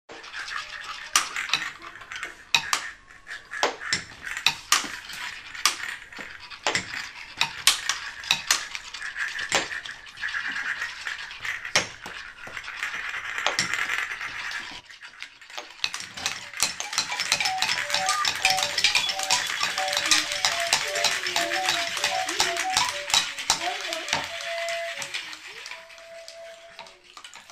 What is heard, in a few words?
crescendo; nature en musique; repetition